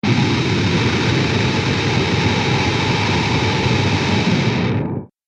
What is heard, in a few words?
Scream Total Distortion; Screaming Sound Effects